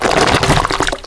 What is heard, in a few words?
smack, shambler